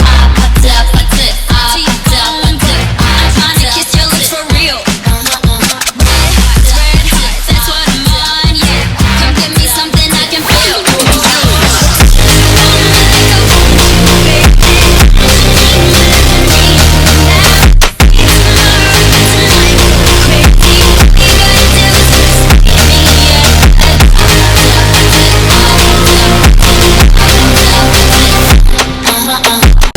brazilian phonk; russkaja pop muzyka

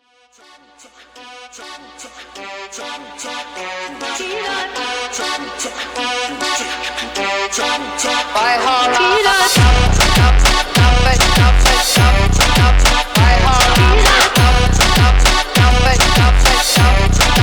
brazilian phonk

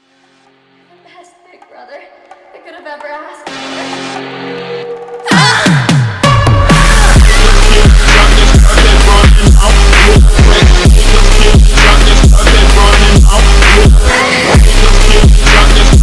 aggressive phonk

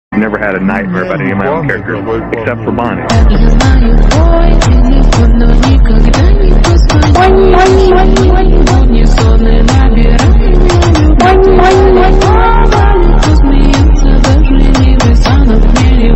hardstyle remix

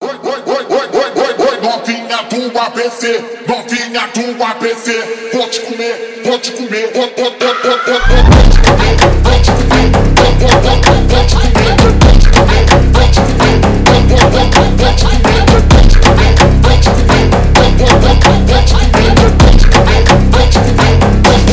brazilian phonk baile

